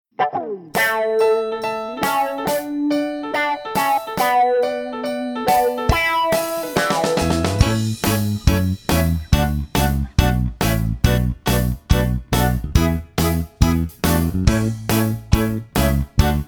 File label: Karaoke Audition Cut